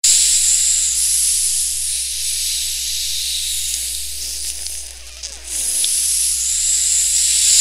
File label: Acid effect